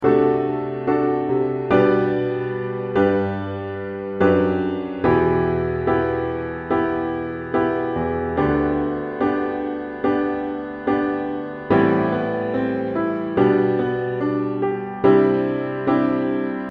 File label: Piano